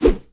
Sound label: hand swing, combat